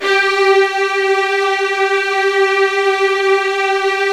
Strings G2 L; Virtuoso

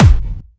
Clubby Kicks, Bassdrums

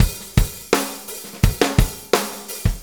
Fonky, Drum Tools, Loops; Sonic Foundry (Sony Creative Software); Loops & Samples Drum Tools

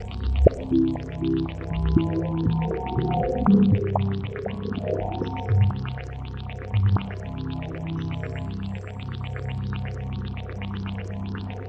Arpeggio, keys

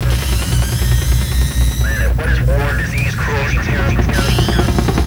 Kaos FX, Ambient Grooves

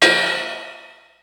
Dance Crash, Ensoniq ASR-X Pro

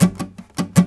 5 PART CHUCK, Nylon Guitar

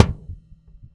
Swinging, Dimensional RL Kicks; Airwindows

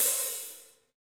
HH HH

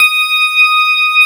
CLAV, FUNK FM CLAV; East West; Twisted Textures-BT

